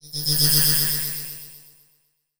Title Transition Delay Short

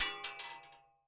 Weapon Bullet Shell 40 Cal Drop On Metal; AdobeCC

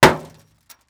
Impact Metal Bang With Debris

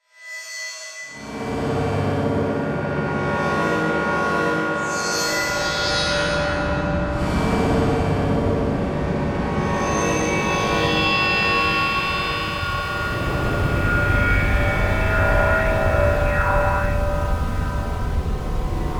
Slow Foreboding Delay; Adobe; Production Element; Imaging

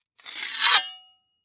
Weapon Sword Scrape On Stone With Metal Ring; AdobeCC

